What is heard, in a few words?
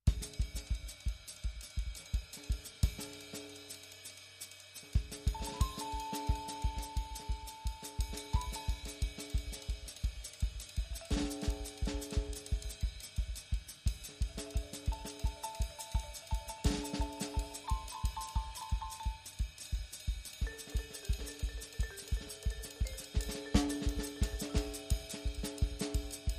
Swing Vibe